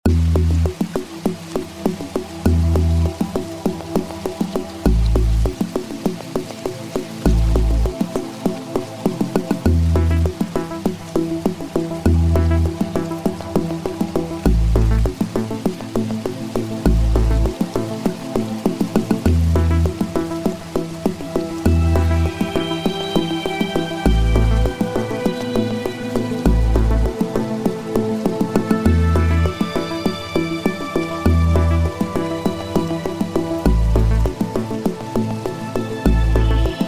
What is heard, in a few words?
beat